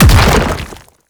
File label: Hand Bomber Proj By Explosion; Warframe; ManInTheWall